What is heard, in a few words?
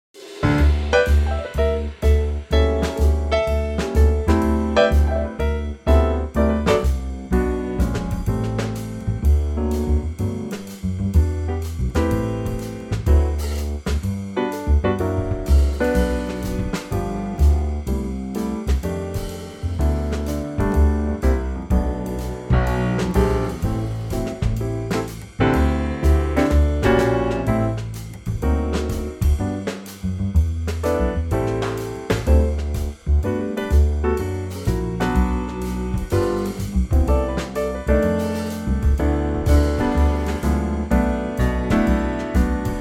G Trio